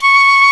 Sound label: fife, British fife; Roland L-CDX-03 Disk 1